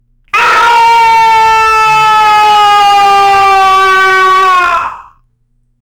the scream of, falling

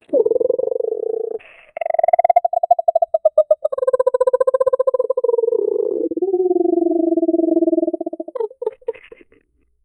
bear noises hollow porce; childlike

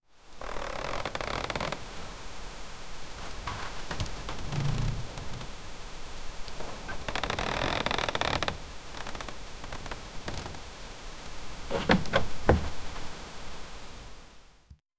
a dull prolonged creak of; architecture

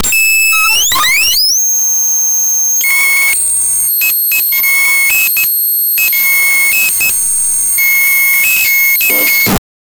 create a sound that can, godlike

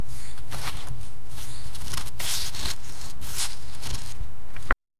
noise of turning pages, barefoot; turning pageswal

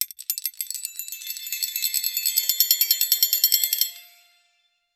intense medieval slot, slot

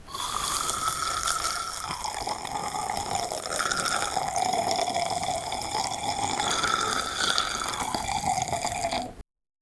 mouth buttering sound

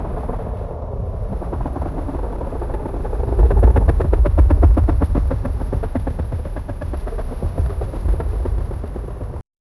gentle breeze whispers in

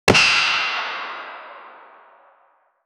baseball bat hit on super

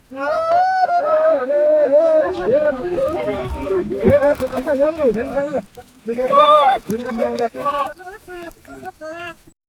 small group of prehistori, primate

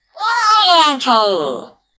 a cheerful satisfied tone; customer

